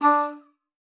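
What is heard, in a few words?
D4, oboe d'amore; Xsample VOL-7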